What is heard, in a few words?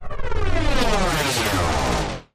Distorted Buzz Phase